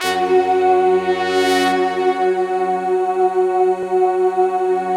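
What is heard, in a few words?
BRASS, Slow Brass Choir; Optical Media International - Sonic Images Library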